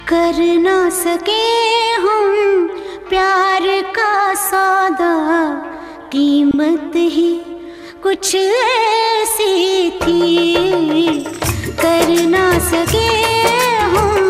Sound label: Very Sad Bollywood Song